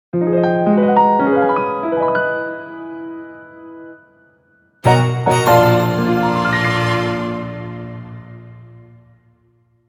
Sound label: Treasure Chest Jingle Fancy; Bayonetta Origins